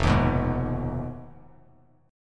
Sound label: F Minor 7th Flat 5th F down Bass Standard; Fm7b5 strummed; JGuitar